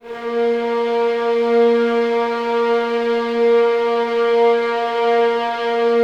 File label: Violins, A#2, Classic Orchestra; Best Service ProSamples vol.51